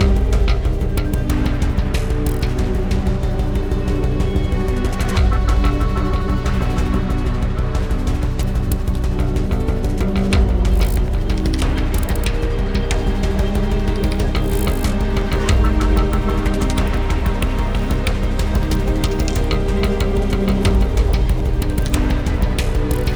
OPENING LOOP

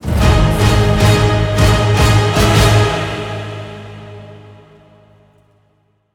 Boss Fight

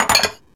dish dropoff; SpongeBob Diner Dash

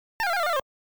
I-Type Missile Spy Ship Hit

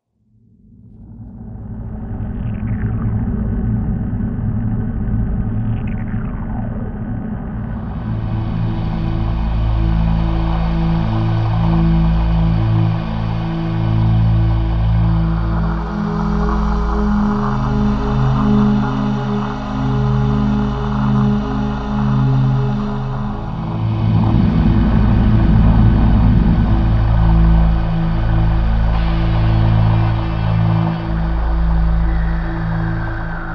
Ambiance; from dusk till dawn

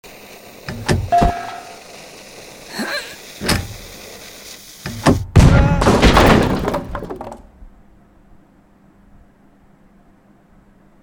Cannon; Stealing The Diamond

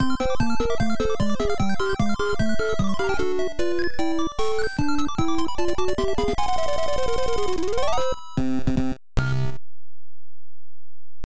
Unknown Fanfare; Tiny Toon Adventures 2 Trouble in Wackyland; nes

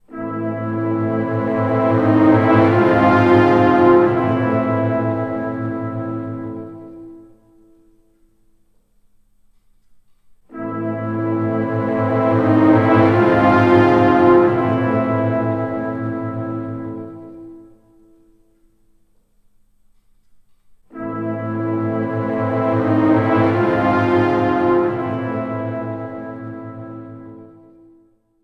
Stingers Fail; Night at the Museum Battle of the Smithsonian; wii; xbox 360

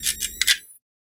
Sci-Fi Weapons-Loading Fx

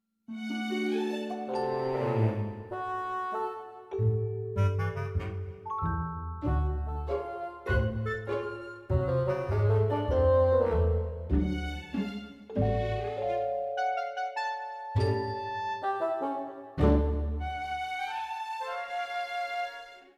Music Future House; Costume Quest 2

